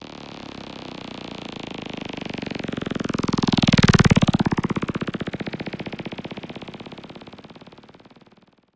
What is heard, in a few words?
echo bike